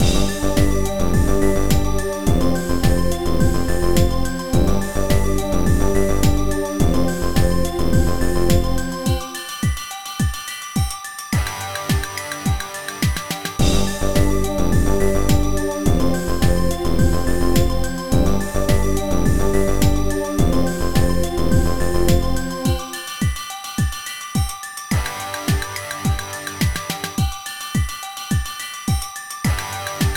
Battle Results

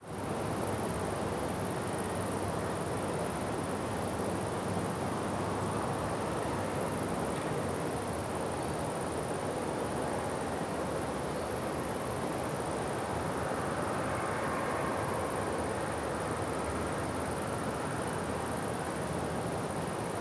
Mattmark dam Village

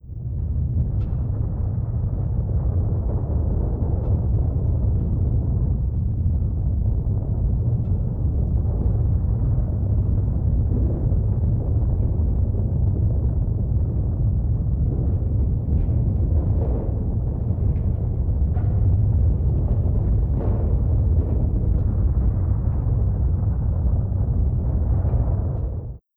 Nileriver Rumble

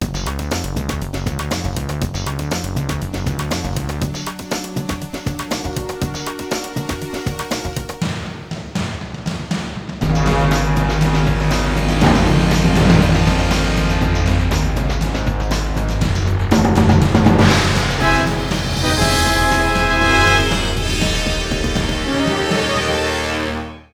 first fight music; Ben 10 Protector of Earth; Wii

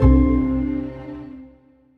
Sting GameOver; Hotel Transylvania 3: Monster Overboard

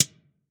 Switch Light; D4DJ Groovy Mix; Android, iOS, Mobile

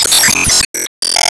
Enemy Tower Active Hit; Se Enemy Tower Active Hit

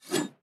Swing Spear Short; Swing Spear Short First

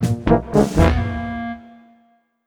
Tank Wars Lose; Mini Carnival; Android, iOS

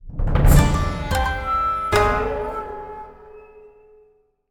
Fanfares, fail; mk9

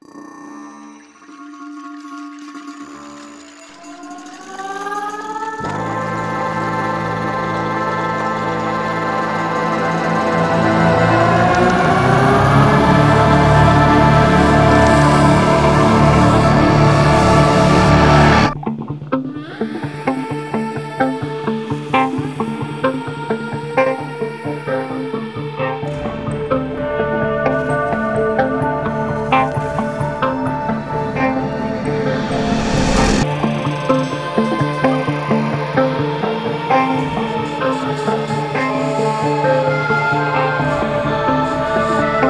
Instrumental; Wuthering Waves

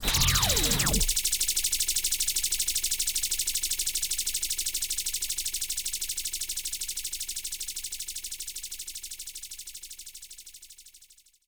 Circle Laser Loop; gamerip; disney star smash; android

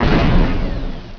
Die

